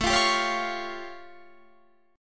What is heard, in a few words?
A Augmented 7th A; x,x,19,22,19,20,19,22